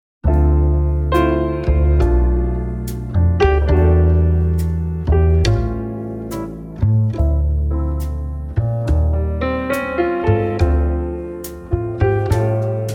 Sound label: Slow Jazz Ballad, flutist; Practice Song No 7